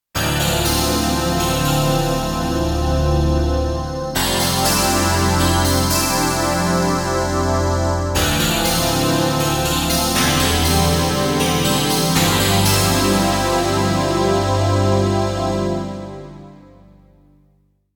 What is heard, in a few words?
Staccato Heaven Yamaha TX816 x5 detuned